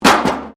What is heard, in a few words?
METAL BIN IMPACT CONCRETE